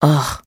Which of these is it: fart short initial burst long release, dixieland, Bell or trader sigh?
trader sigh